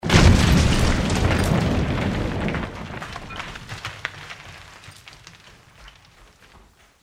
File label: crash experiment